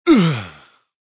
Grunt Punched, Male grunt, impact, punched in stomach, (human voice); Groaning Sound Effects